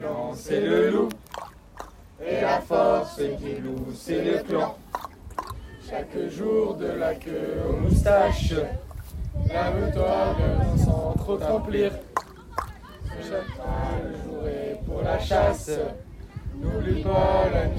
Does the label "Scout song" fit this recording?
Yes